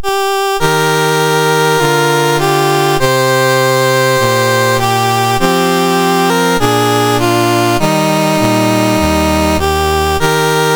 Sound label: Perlis, National Anthems, MOS6581R4; Dave Marquis